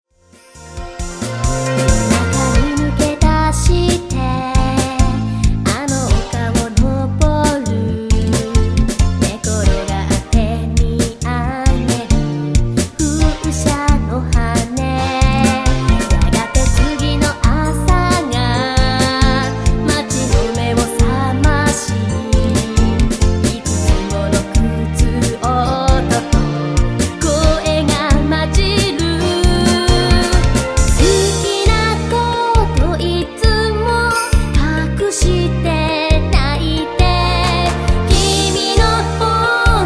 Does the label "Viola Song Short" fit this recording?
Yes